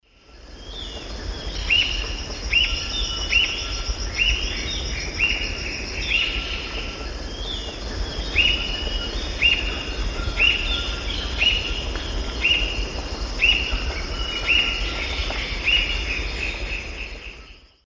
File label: Piaya cayana, song; S. America; Eastern Lowlands